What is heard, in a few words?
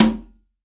CONGA, STEREO CONGA; AKAI S-Series CD-ROM Sound Library VOL-5